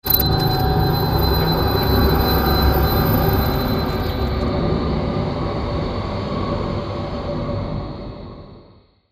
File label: Hit To Whoosh; Production Element, Imaging Element, Accent, Transition; BlastWave